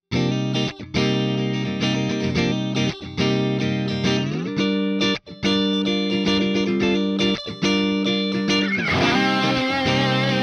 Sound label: Electric Guitar; focusrite